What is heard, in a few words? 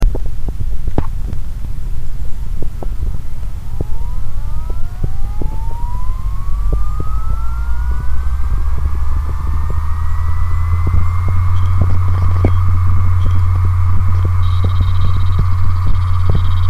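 WD 1TB servo dead, hard drive; Почему у специалиста по восстановлению данных должен быть хороший слух?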